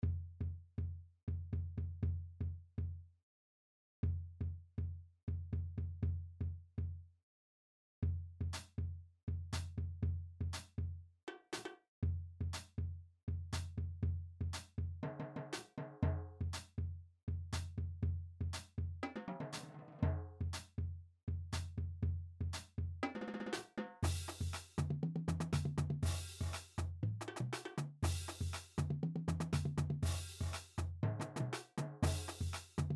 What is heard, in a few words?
Scratch remix, cadences